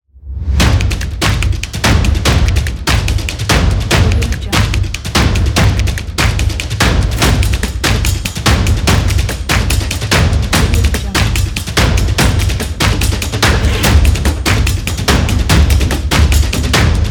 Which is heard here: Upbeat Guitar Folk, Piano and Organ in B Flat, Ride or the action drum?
the action drum